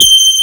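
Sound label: horn G5, synthesizer analog horn; AKAI S6000 CD-ROM - Volume 1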